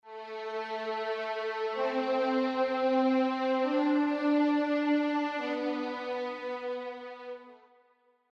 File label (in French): legato, technique de legato notes liées; Cubase